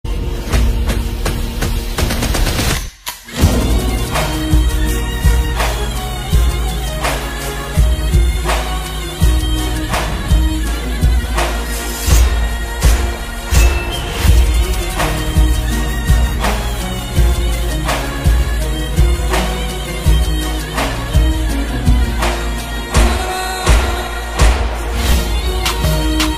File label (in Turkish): Plevne Marşı Remix Song Ringtone